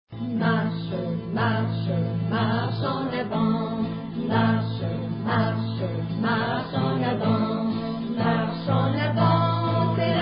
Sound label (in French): marche en avant, musique